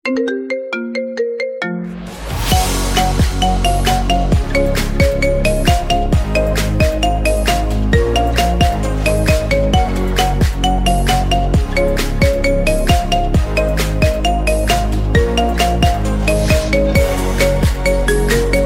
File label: Marimba; iPhone 9